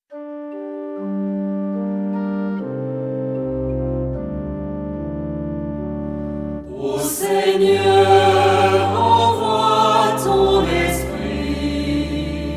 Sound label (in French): Psaume, Ô Seigneur envoie ton Esprit; Ps. 103; M. Wackenheim; Pentecôte ABC